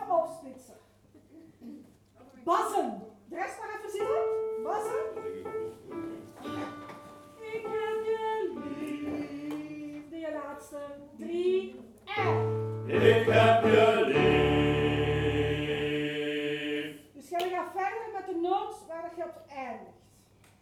Pastorale bas; SINT-CECILIA KOOR ROTSELAAR

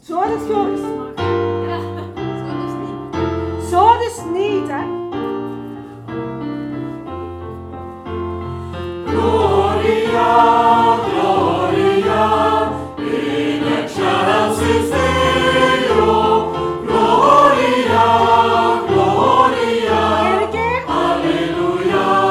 Taize

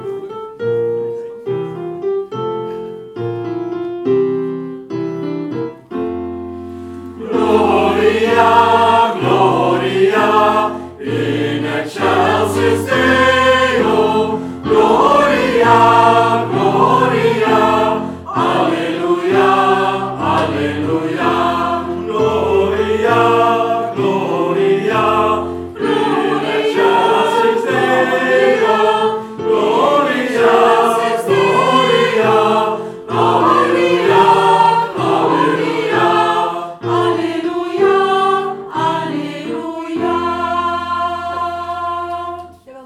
Taize

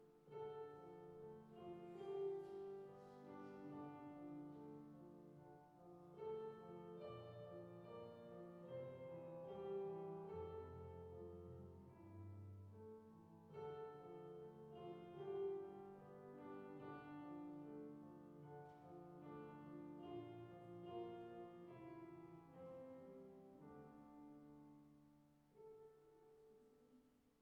Taize SOP

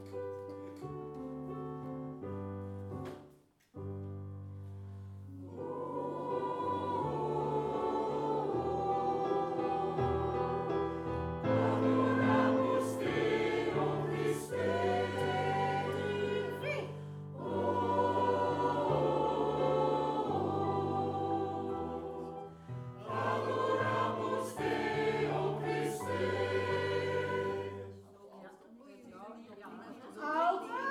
Taize

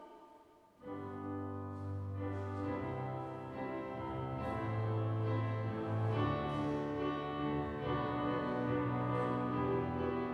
Taize SATB

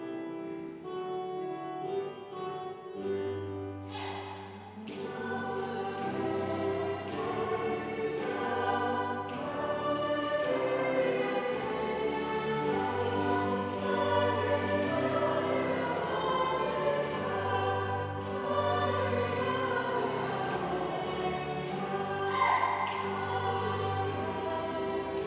Taize

